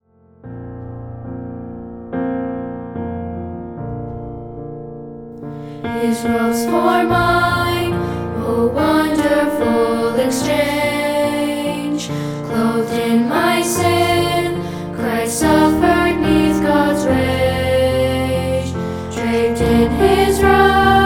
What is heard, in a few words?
His Robes PNO